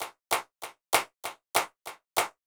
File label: clap